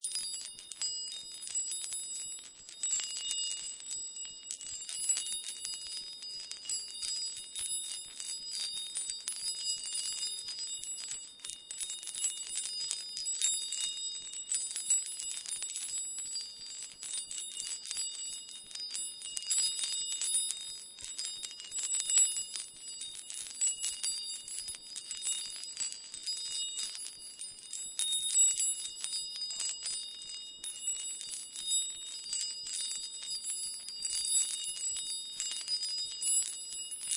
Windchimes Long Loop, A childs dream catcher wind chimes constantly dan; Windchimes Long Loop: A childs dream catcher wind chimes constantly dangling and tinkling under ongoing movement. Tiny, small bel; Windchime Sound Effects; Lynne Music